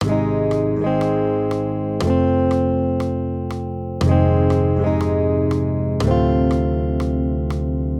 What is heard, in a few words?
maj triads; accompaniment